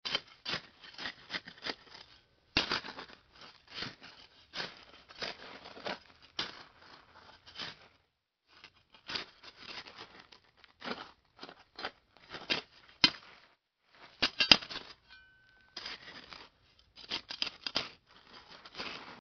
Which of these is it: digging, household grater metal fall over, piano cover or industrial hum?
digging